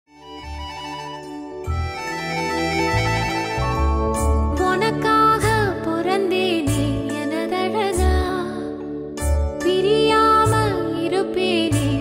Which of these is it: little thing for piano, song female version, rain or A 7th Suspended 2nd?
song female version